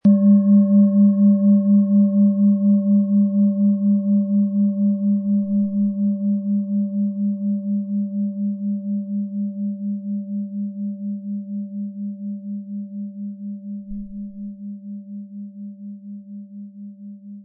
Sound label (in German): Planetenschale